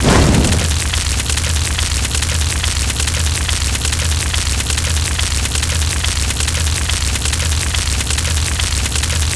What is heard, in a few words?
flame